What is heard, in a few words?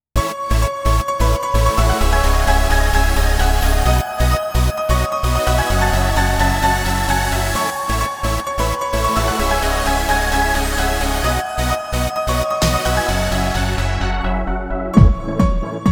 loop